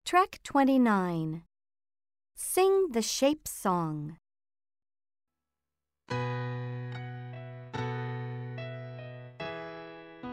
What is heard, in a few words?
the shapes song slow